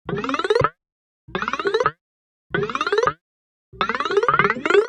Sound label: box fx; Kaelin; Vignette Kit